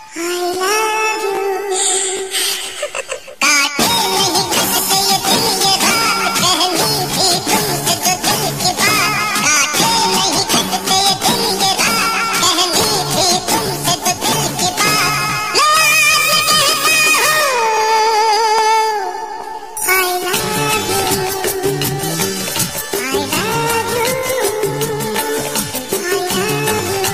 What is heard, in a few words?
High Pitch Remix Funny Hindi Ringtone